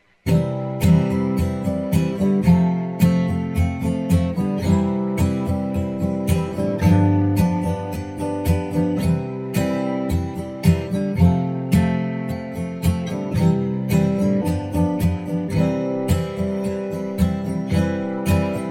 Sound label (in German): Gitarrengriffe C F G7